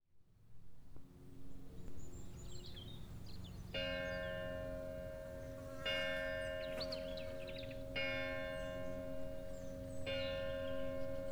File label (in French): La Bruguiere 11h sortie du village